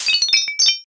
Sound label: glass break